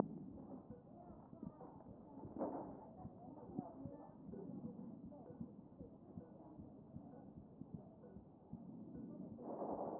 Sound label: chest